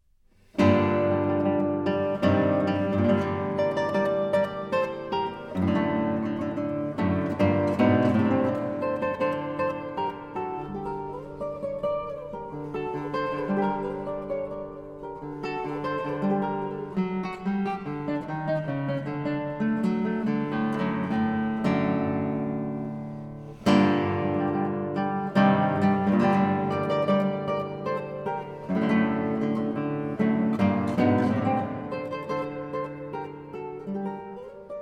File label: Minuet In G Minor